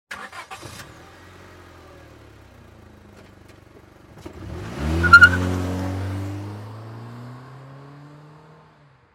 Transportation Cars Honda Civic Start Drive Off Fast Peel Out